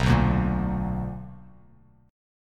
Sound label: B Minor Major 7th, down, Bass Standard; strummed; JGuitar